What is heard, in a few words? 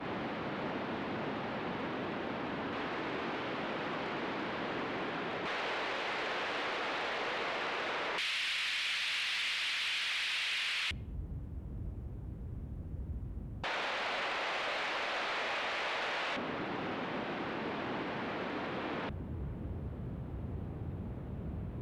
Filtered Noise